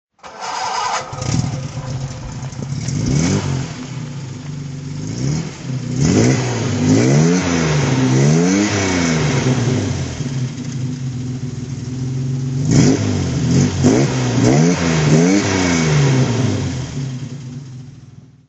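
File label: jaguar xk150 s fhc throttle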